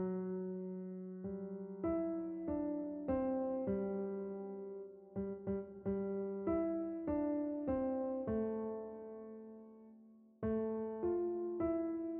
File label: JingleBells